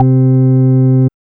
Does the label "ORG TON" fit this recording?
Yes